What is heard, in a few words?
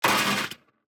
DICE on Metal, Throw and Roll, Standard, 16 Sixteen Dice; Samples; ArticulatedSounds; DICE Sfx Party (Extended)